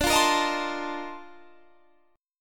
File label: Bb Major 11th D